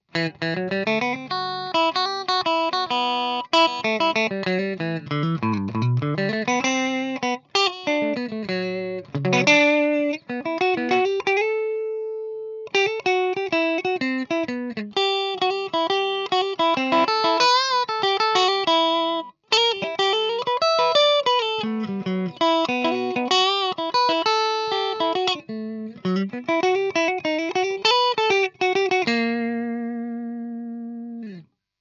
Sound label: Clean Neck Single Solo